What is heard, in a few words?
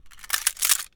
gun mechanism strong; soundmorph